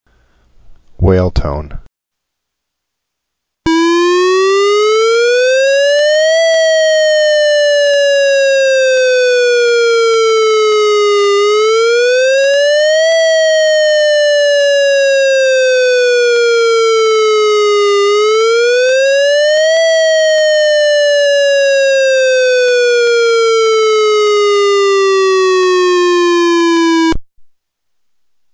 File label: wail, Emergency Warning Systems; Office of Emergency Management